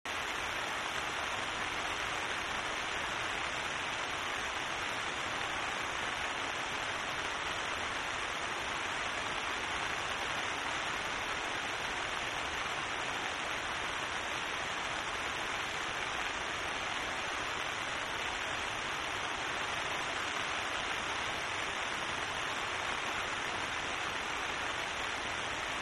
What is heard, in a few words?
Rain Heavy